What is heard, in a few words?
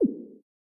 screen off; Samsung Galaxy-S8; STalkback